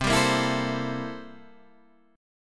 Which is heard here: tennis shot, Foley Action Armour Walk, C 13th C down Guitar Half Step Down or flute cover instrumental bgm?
C 13th C down Guitar Half Step Down